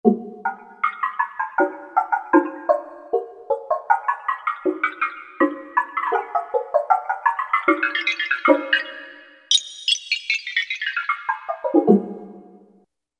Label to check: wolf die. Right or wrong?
Wrong